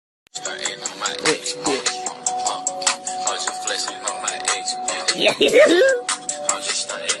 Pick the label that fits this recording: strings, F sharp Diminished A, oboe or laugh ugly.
laugh ugly